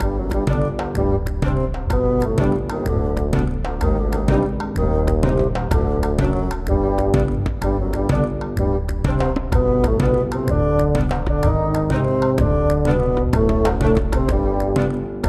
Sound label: map music; Shrek; Xbox